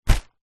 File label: household umbrella close grab hand